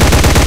mgff loop, guns

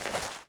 grass slip

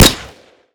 p90 silencer, weapons; Modern Warfare

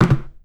bone uaz hits cone single; Call of Duty Modern Warfare

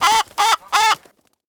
attack; Rust

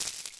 Beads; Mali; Civ4 beyond sword

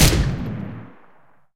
cannon t 160 loop last shot; guns; War Thunder

